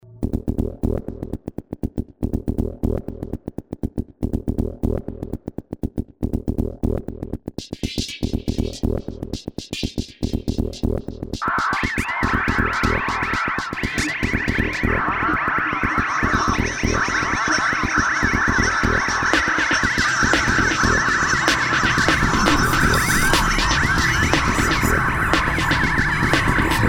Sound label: RING